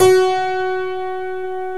TACK PIANO

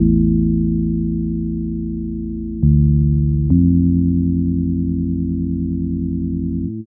synth loop, drum n bass

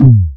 KICK PRO -R, Disco House; USB Soundscan vol.20 - Fresh Disco House I

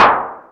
NOIS, Techno Trance; E-MU Formula 4000 Series Vol. 2

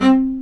STR, Low Marcato; String Master Series; Combos 1

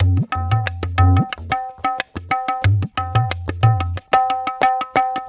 Tabla

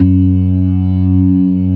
TARK, organ combos

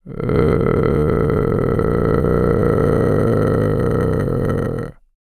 GROWL, VOCAL FX

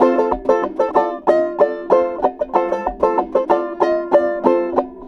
Banjo; Rondo Brothers; Sing Into The Machine; Sonic Foundry (Sony Creative Software)

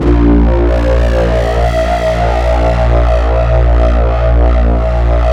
SYN LO RE; AMG - Now CD-ROM (Roland); Pure Gold

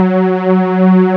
PAD WARM, Nord

